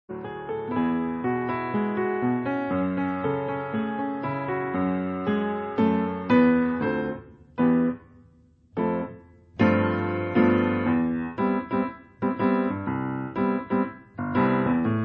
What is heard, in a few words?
Piano; The Great Giana Sisters